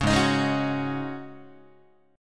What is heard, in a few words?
G sharp 7th Suspended 4th G sharp, Guitar; G#7sus4 strummed; 4,4,6,6,5,5; JGuitar